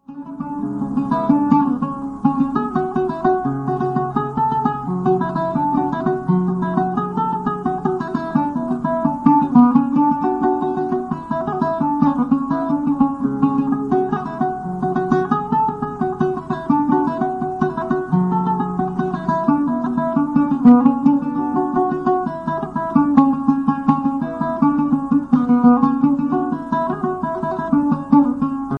traditional classical musical mobile tone ringtone; galaxy ringtones